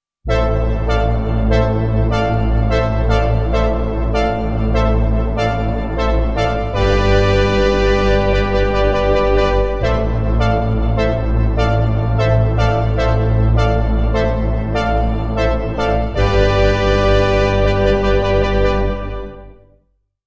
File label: Synth String